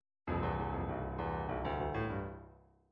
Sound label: rock fill, midi